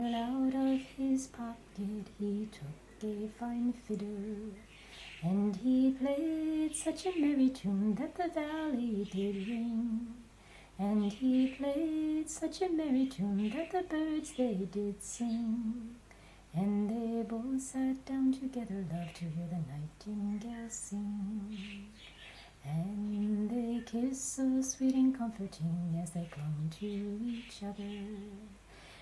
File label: Irish Song